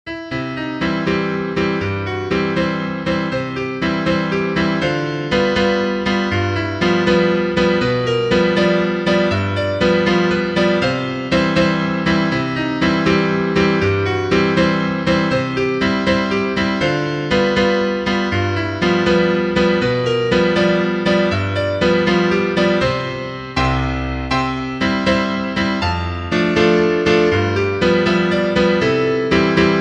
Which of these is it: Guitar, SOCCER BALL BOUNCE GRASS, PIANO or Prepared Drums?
PIANO